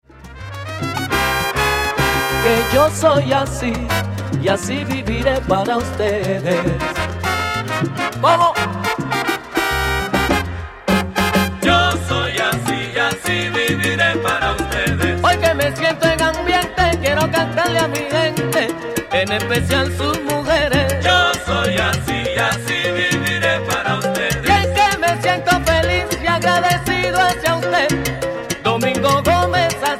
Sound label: Rumba